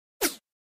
Button Whoosh Sci F

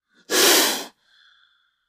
Cobra, Indian Hiss. Close Perspective.; snakes

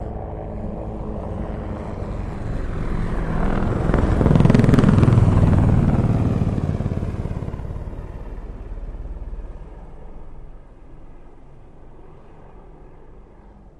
Apache By Med Slw Righ, ah-64 apache

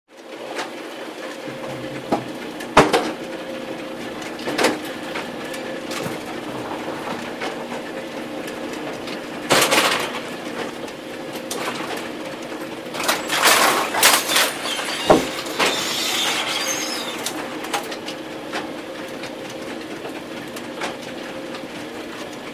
Automatic Bowling Pi, bowling

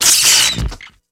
Electric Arc Discharge, Burst Poof; arcs & sparks

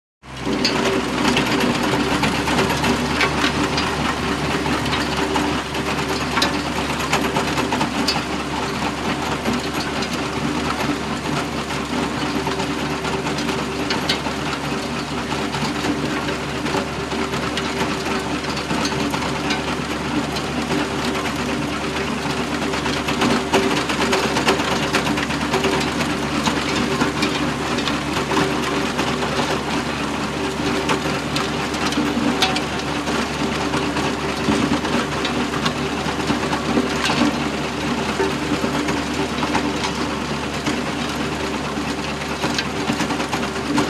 Tractor Tills Dirt

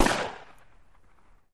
Gun Shot Sngl Fire, silenced singles

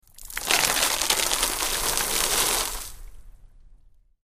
Large Sea Sprays Fall, bow wash

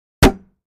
Gallon Jugs Strike To, plastic

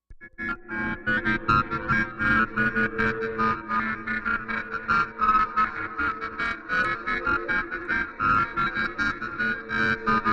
Mixed Message Machine, communications

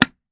Box Lid Small Close S

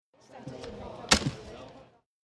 Stamp Ticket Passpor, airport

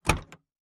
SUV gate open, tailgates

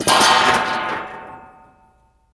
Sheet Metal Drops; shutters & movement

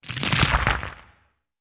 Quick, Small Rocks Impact with Rumble; Beginning Or End Of Avalanche

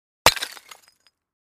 Clay Chimney Vent Hit, concrete & brick

